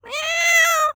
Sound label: Meow